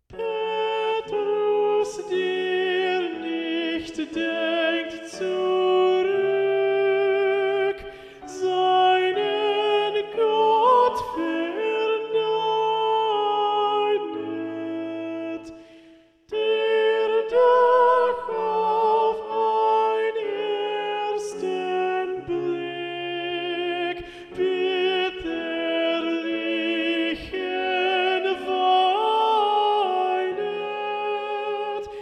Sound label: Alto